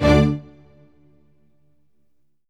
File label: ORCH HIT D2; Q Up Arts; Denny Jaeger Private Collection